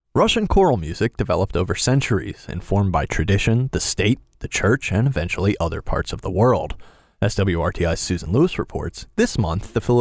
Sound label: Russian Choral Music